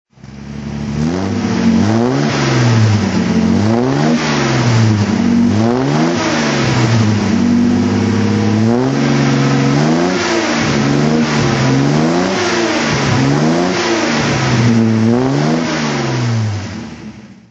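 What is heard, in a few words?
austin healey 3000 mk2a throttle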